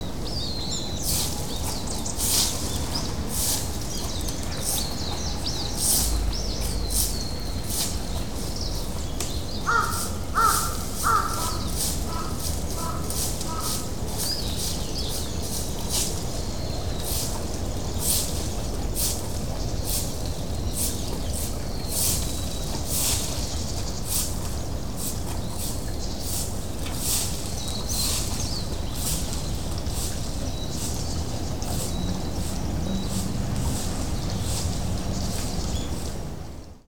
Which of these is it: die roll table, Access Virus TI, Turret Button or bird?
bird